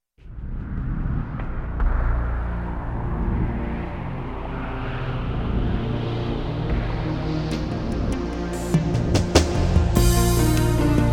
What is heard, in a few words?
Trance